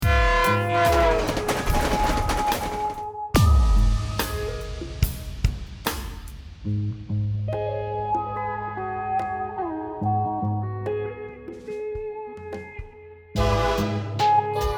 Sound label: slo funk train